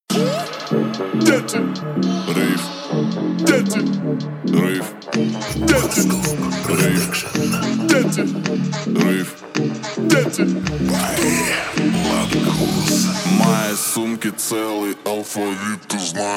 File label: 35-39Hz Low Bass